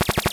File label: standard shot short x4 loop